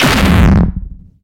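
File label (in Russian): кик барабан обработанный цепочкой насыщения эрозии и случайного резонанса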